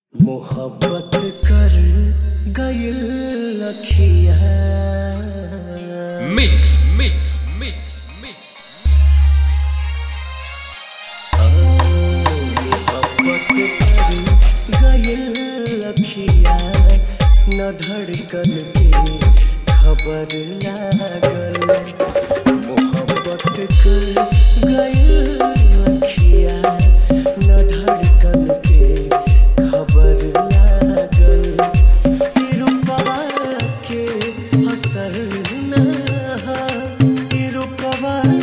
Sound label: Sad Bhojpuri Dj Song